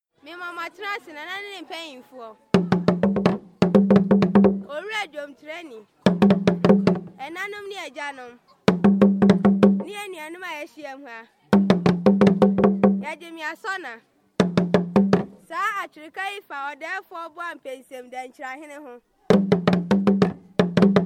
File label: Ghanian Talking Drums